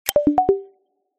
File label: roll